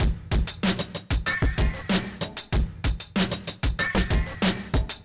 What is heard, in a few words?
Hip hop Beat Vocals